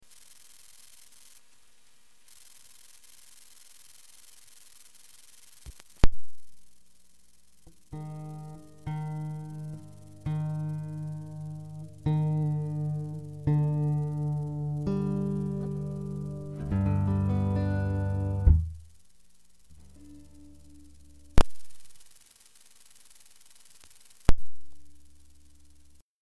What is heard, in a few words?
macbook garageband noise